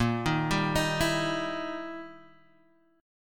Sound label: E Minor Major 7th Flat 5th A sharp